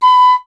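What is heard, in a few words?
flute piccolo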